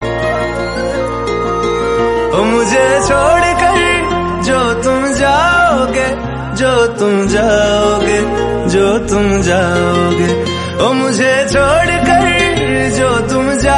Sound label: hindi song